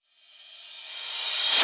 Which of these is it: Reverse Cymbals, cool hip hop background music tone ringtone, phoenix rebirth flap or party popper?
Reverse Cymbals